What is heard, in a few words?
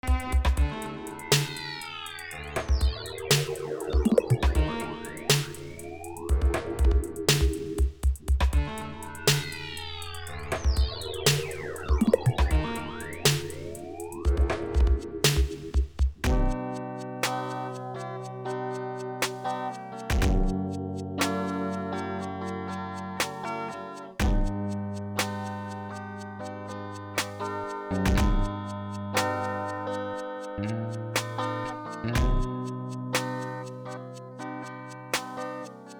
stretched guitar song